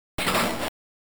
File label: slime machine